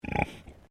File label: Animal Pig Landrace Grunt; Alice; Edgar's Mission Farm Victoria; Pigs; Animals